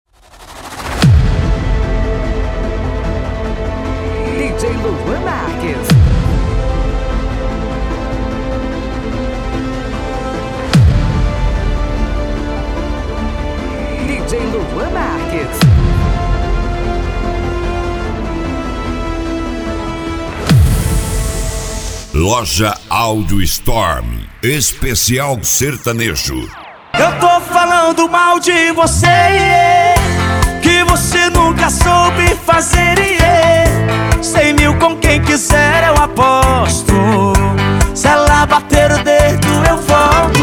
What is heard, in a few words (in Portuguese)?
especial sertanejo; loja audio storm